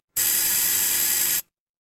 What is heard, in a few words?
door doorbell